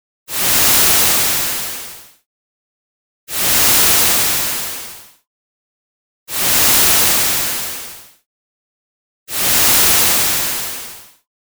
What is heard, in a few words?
Allpass Slow Envelope